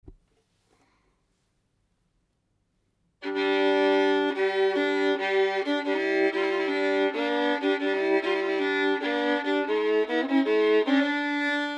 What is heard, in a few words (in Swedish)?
Stämma B del